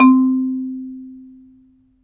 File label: MARIMBA; ILIO